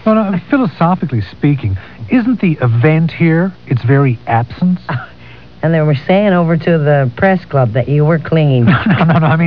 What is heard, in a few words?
clean